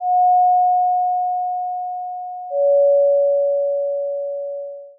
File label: doorbell